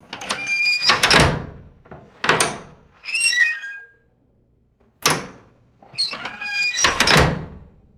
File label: squeaky metal jail door latching shut; doors